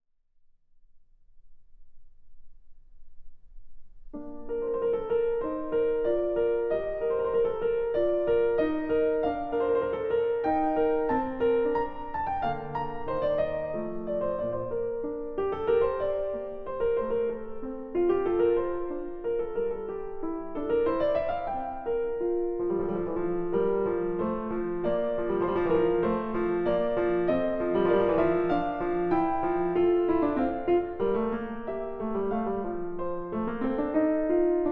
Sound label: Partita in B flat major, Praeludium; Partita No. 1; BWV 825; Bach